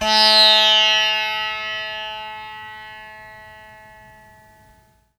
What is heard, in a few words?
Indian Percussion Tabla; Mango Loops